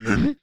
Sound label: Yeti Stand; Seer; GamigoNA